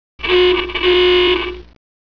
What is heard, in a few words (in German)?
comic hupe; geraeusche virtuell